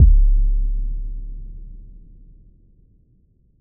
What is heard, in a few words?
dark cinematic sub impact hit